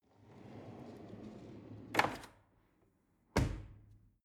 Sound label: drawer cutlery close draw